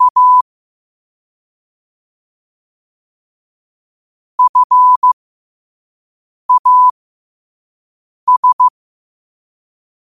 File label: 5 wpm, morse; Daily Quote